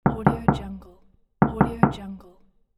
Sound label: door knock